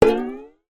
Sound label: cartoon ascend strings slide classic; zapsplat